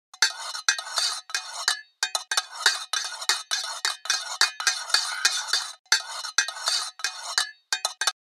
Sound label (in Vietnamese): Tieng thia muong kim loai cao vao day xoong noi